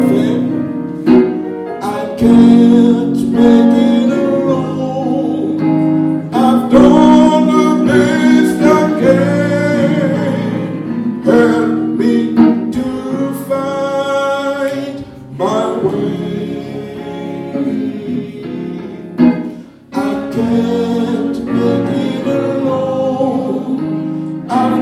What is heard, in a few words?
tenor